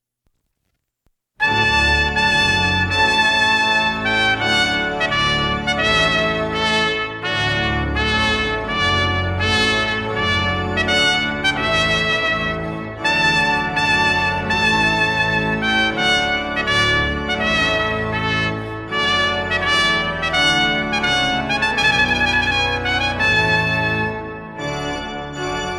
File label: Trumpet Voluntary